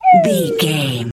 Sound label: whistle slide down